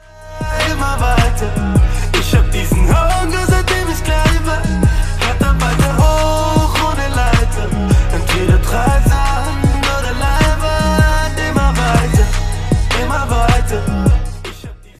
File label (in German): deutsche rington